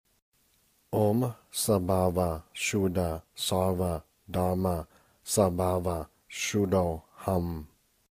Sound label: Śunyata Emptiness Mantra Sanskrit